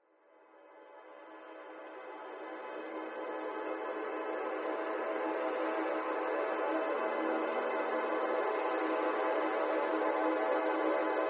bg loop, gamma; thetascape

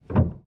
piano release; samples-piano

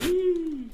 wee

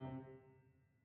piano harmonics C3, Grand Piano

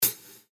highhat

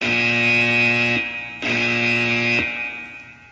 alarm; builtin

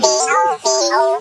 bb8 beo beo

